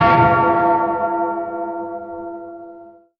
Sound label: clonk, Bells